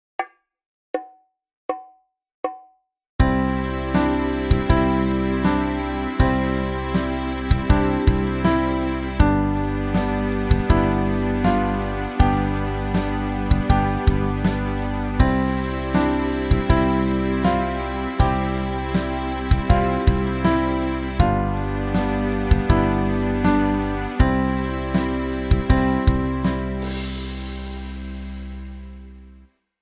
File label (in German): keyboard g dur hoerbeispiel